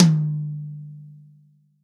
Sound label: TOM, Real Drum Kit, POP KIT